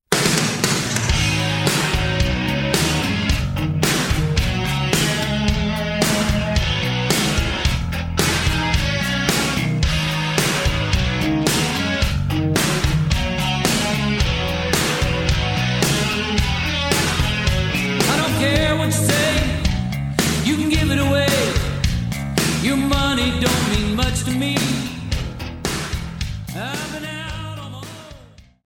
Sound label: heartbeat